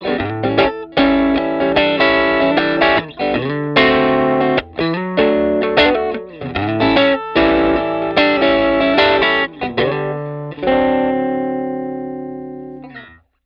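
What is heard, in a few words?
STRAT POS 3 WARM DRIVE OVERDRIVE LICK, Middle pickup through a 1950 Fender Deluxe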